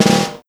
Snare Roll

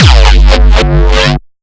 Kick Dist w Synth; Granular Sumo

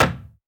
wood; foxdot default

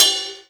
Ride Bell Short

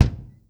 Kick Acoustic

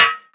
Perc Metal

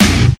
Heavy Guitar Tom; Kodack; pitchglitch

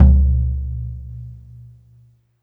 Tom Low; Lightning1024

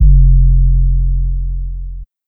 Kick; Pyrex; pitchglitch samples

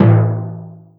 Tom Hit Mid; Pyrex

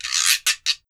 Perc Guiro Toca

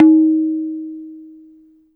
Perc Tabla Tun

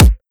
Kick Dist Force

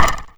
Perc Wood

